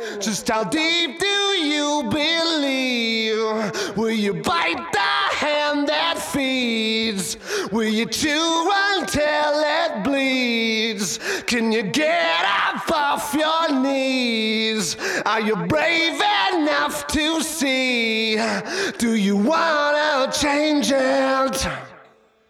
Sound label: lead vox